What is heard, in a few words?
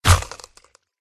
bat attack contact bottom